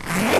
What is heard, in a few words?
unzip